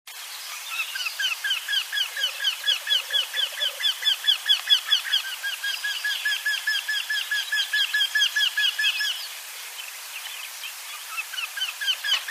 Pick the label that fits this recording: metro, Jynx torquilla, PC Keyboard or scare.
Jynx torquilla